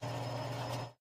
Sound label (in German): tankstelle tanken; minecraft